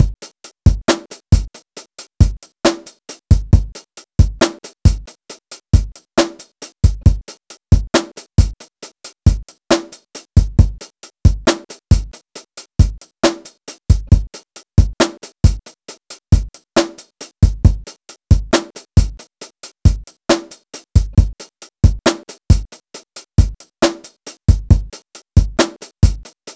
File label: Drum Kit, Electro Lounge; Sonic Foundry (Sony Creative Software)